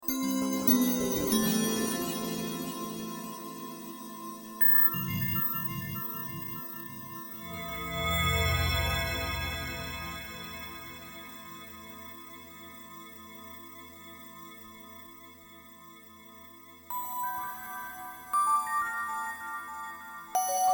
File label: Bell Threshold; Norwich